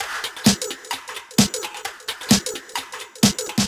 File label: 130 Dub Me Live No Bd, Drum Loops 130bpm; Zero-G; Soundclash Nu Dance Electro; Acidized Wavs